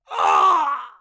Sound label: male death